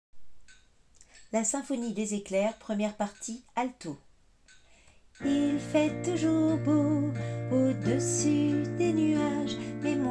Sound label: alto